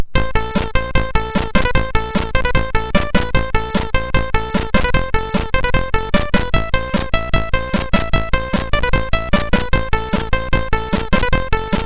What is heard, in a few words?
sid, MOS6581R2